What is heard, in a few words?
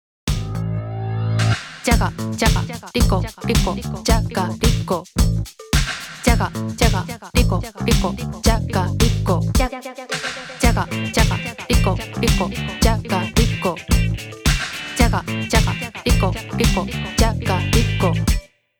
rhythm; じゃがりこリズム